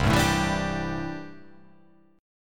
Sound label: C 6th Add 9th C down